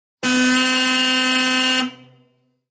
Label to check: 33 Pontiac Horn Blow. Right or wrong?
Right